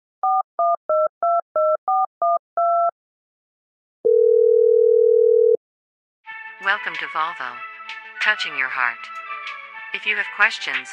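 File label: telephone loop; volvo; telefonschleifen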